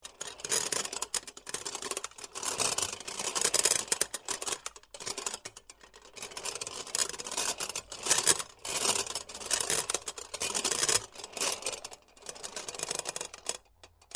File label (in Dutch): knikkerdoos